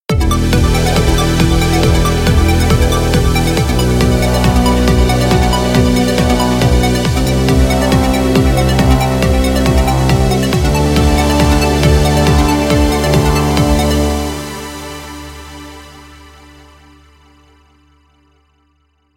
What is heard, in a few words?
kick